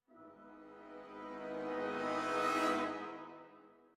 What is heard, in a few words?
music interactive; World of Tanks